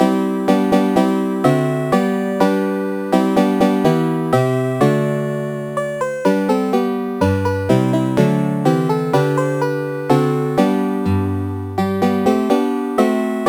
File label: xmas; Musicraft